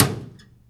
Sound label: Small Metal Door